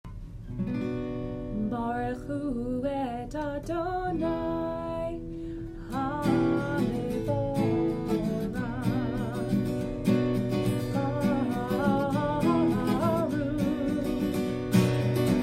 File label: Barchu Traditional